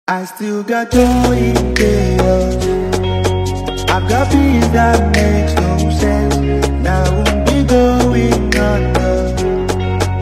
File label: Afro Drill Instrumental